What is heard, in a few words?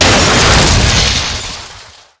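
destroy wires explosion; Explosions; Call of Duty Modern Warfare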